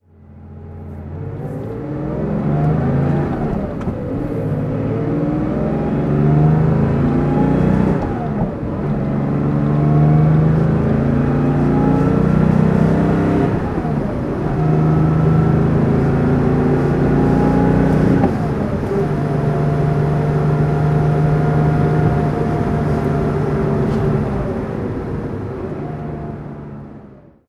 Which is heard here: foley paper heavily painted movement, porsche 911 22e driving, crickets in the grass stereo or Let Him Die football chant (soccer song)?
porsche 911 22e driving